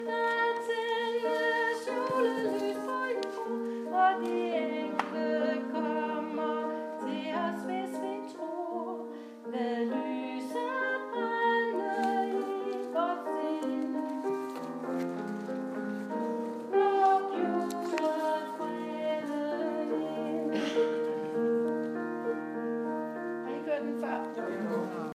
luk julefreden ind sopran

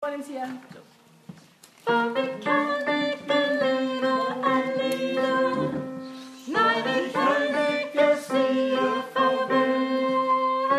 omkvæd tenor

